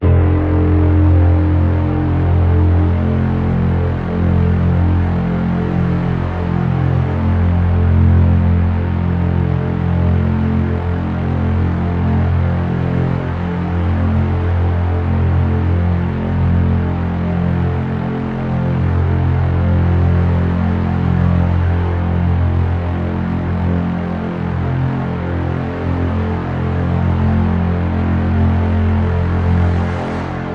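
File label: drone nice buzz F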